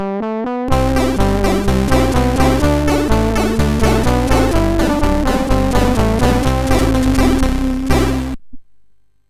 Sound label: sid MOS6581R4